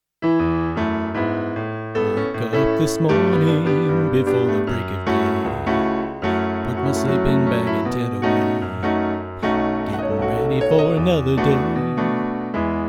Song No Bass

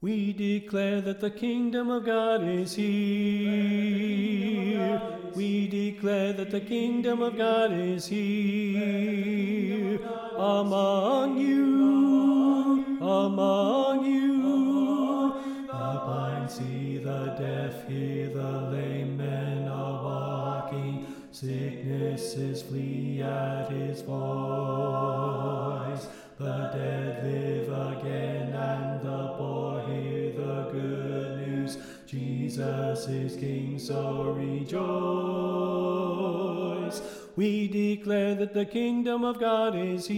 Bass Left

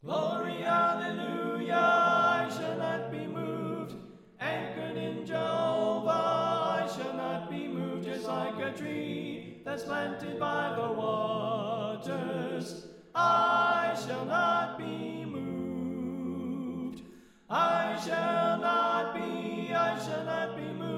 Alto Left

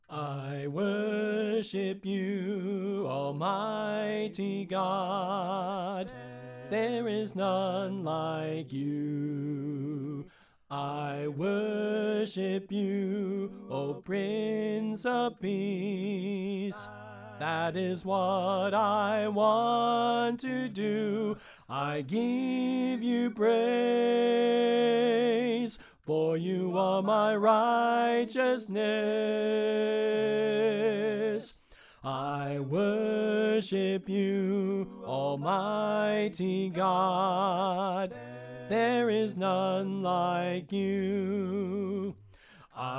Soprano Left

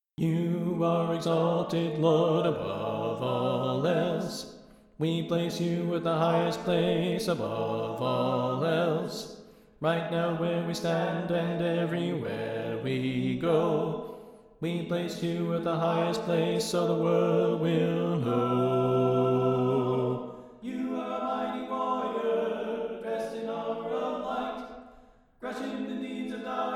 Bass Left

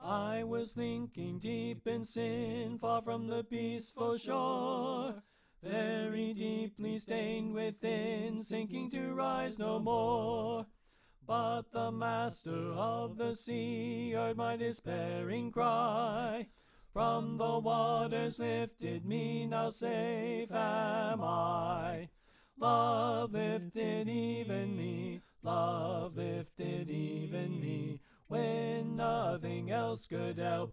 Tenor Left

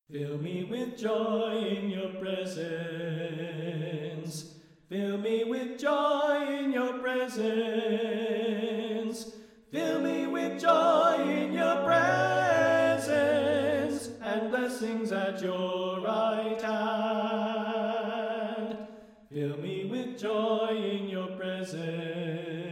Tenor Left

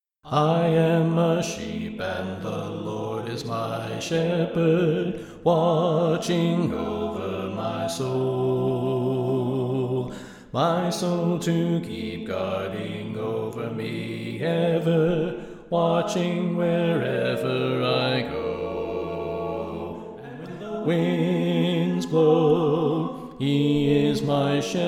Bass Left

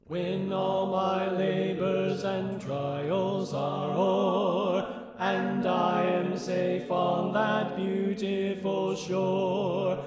Tenor Left

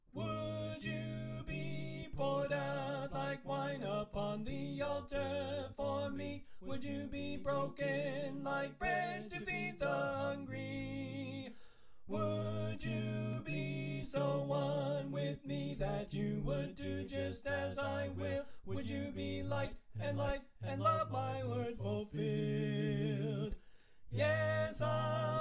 Alto Left

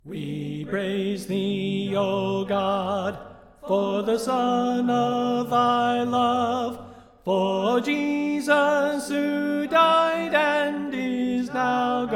We Praise Thee O God Soprano Left

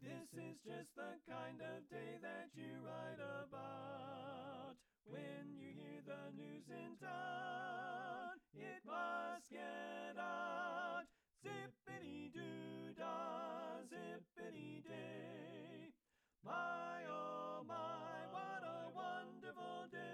Tenor Left; Pennsylvania Groups; NBC

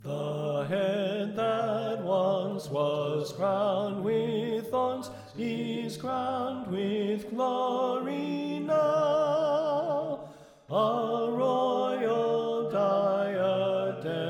Soprano Left; The Head That Once Was Crowned

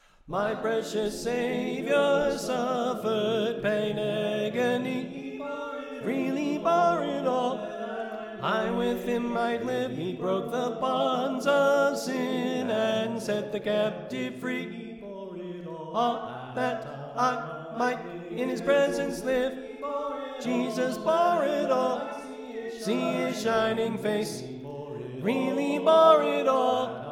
Tenor Left

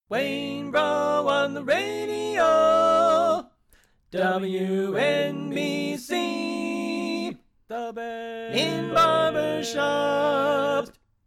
Barbershop Tenor Left; Pennsylvania Groups

